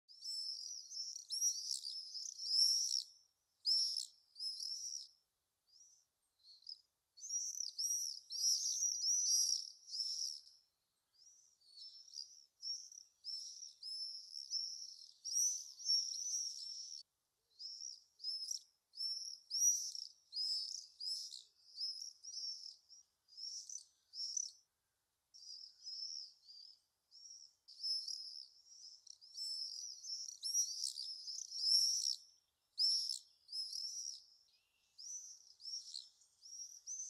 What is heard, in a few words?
Common swift; پرنده بادخورک معمولی